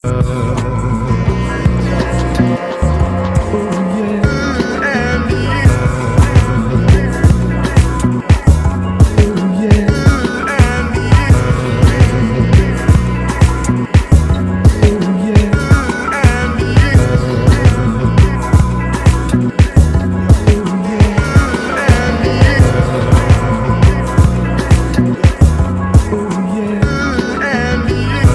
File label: New Beat Bossa Nova